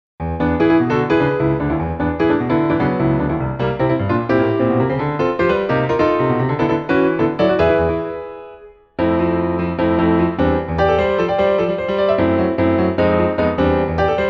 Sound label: piano; VS Excite Bike